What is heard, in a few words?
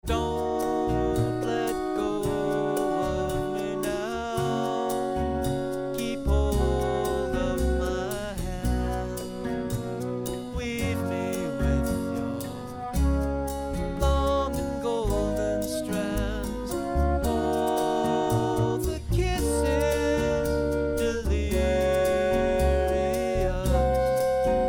Acoustic Before